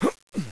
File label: hurt; gusanos promode